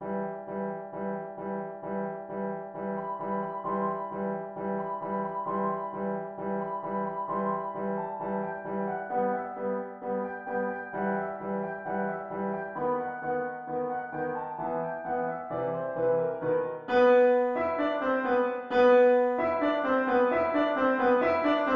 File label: barber of seville piano